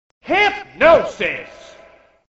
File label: hypnosis attack